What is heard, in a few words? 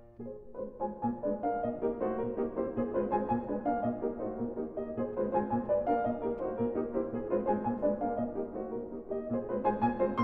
Carnaval for piano; A.S.C.H. - S.C.H.A. (Lettres dansantes); Schumann